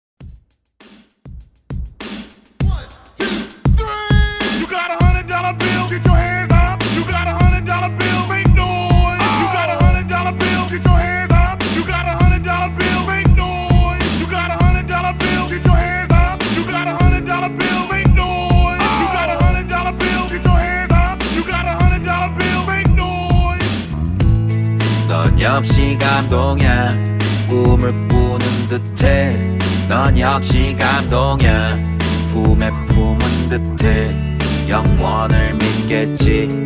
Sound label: mix 100 bpm